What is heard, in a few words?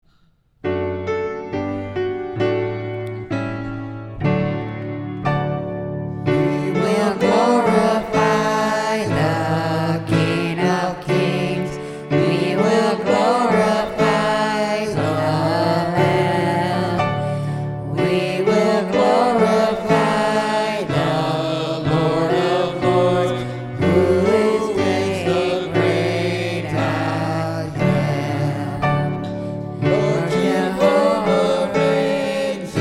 Choir rehearsal